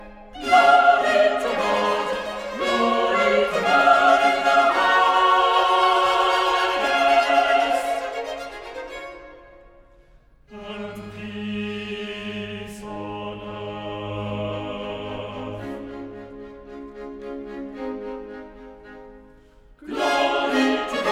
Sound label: chorus glory to god; Handel Messiah